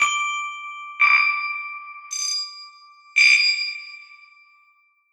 Resonate; LG; P940-Prada-3.0; alarms

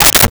Dresser Drawer Handle; 1001 Sound Effects; Doors & Drawers

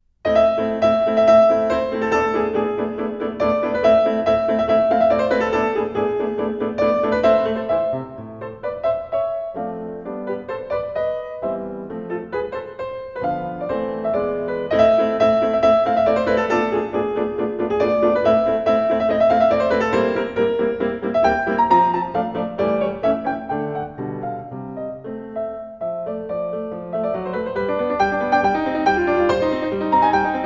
Piano Sonata; Nodame Cantabile; Piano Sonata Dai 8 Ban A Tanchou K310 (K300d)

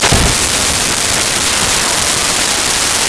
pulse; Sound Effects Library (28Cds)

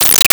Arcade Action; Arcade and Games

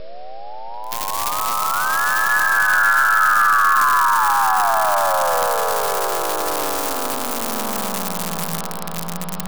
scifi; reggae fx; Dancehall FX Packs

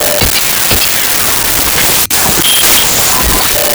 starter; Sound Effects Library (28Cds)

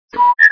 disconnect, Phones

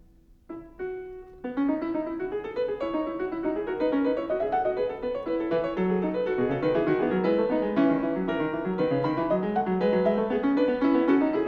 Fugue No. 9 in E major; BWV854; Glenn Gould; Bach; The Well-Tempered Clavier; WTC Vol I

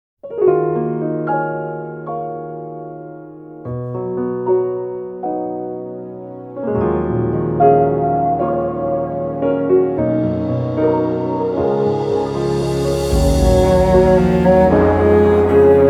without Vocal; Gundam 00

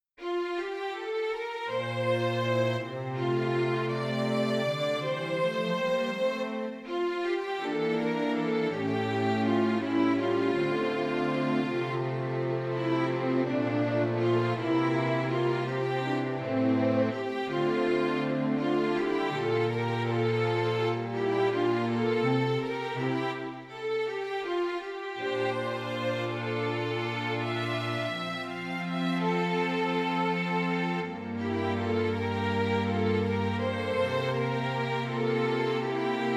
Instrumental & Strings MIX; Mahou Shoujo Lyrical Nanoha

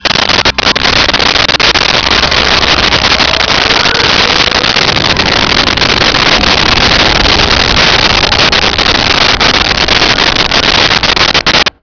sfx pod ani start

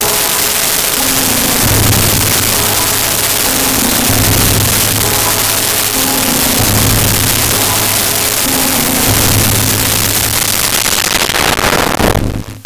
tension; Rosegarden

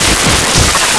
starter; Sound Effects Library (28Cds)

